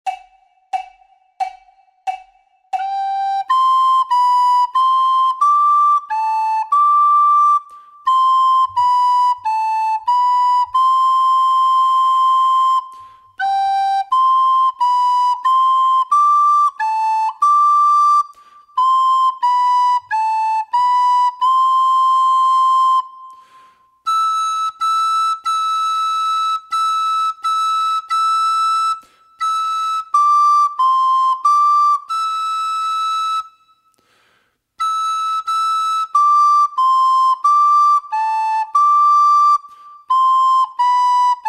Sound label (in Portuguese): FLAUTA SOLO